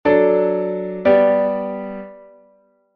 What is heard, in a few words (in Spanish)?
imperfecta en sol M